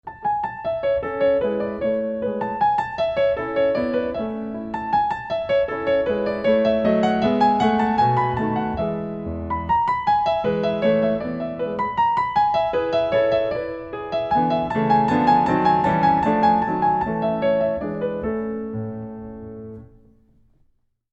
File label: Waltz; Mozart; K567